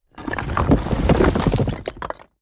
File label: roll rubble; smite; god geb skin7